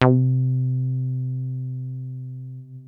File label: 303 C3, TB 303, Synthesizer